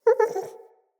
Wolf Cub Trapped Call Close

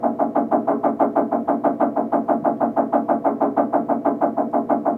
Ocean Steady Machine Loop; Ambience; Props; Warframe; Grineer Ocean

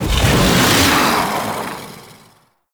Flight Whoosh; Warframe; Teralyst; Ropalolyst

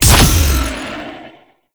Power Slide Boost Ready Stinger, Motorcycle; Warframe; Motorcycle Ninety Nine

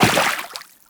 Step Water, Footsteps; Warframe; Teshin

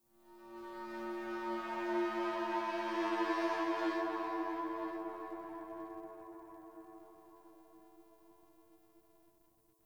ambient sentient moan; Warframe; Tau

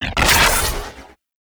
Arch Gun Primary Beam Shot; Weapons; Warframe; Archwing; Hildryn

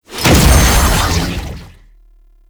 Hellfire Cast; Roathe; Warframe; Tau; Abilities

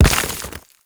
Mech Step Surface Dirt; Vehicles; Step Surfaces; Warframe; Nechro Mech

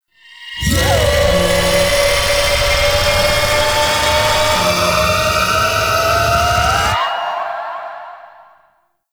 Angel Vocal; Warframe; Zariman; Angel Song

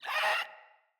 Infested Runner Pain

